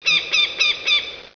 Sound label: four hawk